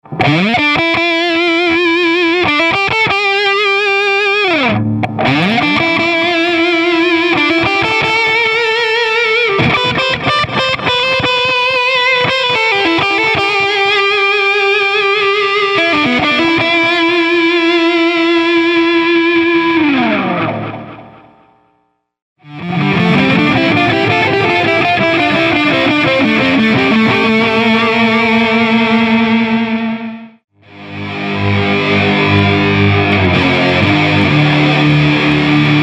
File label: Tube FX Loop